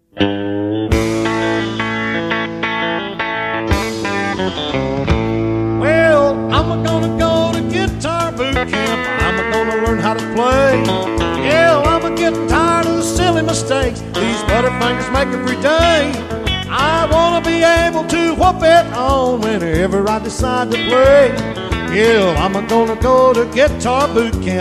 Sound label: Guitar